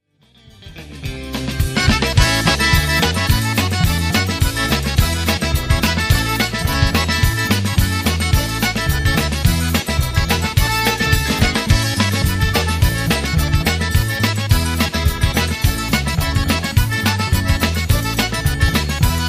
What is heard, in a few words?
Creole Stomp